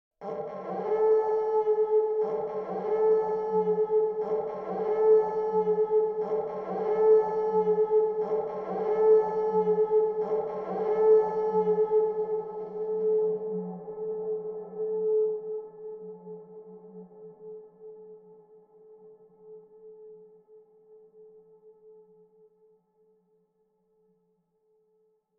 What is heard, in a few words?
Alarm